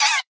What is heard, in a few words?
land idle, guardian; minecraft; mob